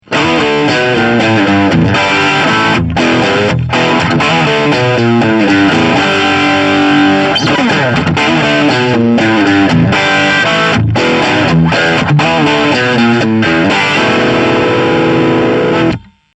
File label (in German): MXR Vergleichstest Pure